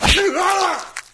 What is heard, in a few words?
wolf; cstrike